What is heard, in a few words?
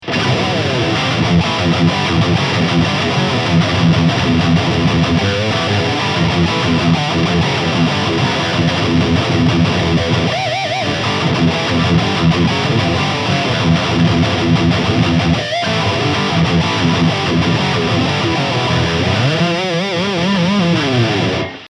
LTD MK 600 High Gain Active Mode